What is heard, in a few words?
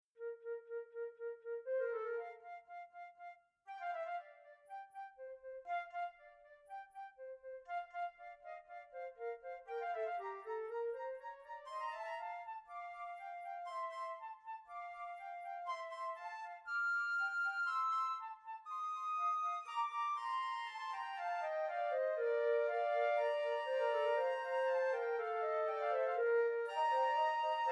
Woodwind; Sonivox; Film Score Companion; Orchestral Companion Woodwinds; Holzblasinstrumenten